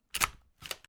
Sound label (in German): Locher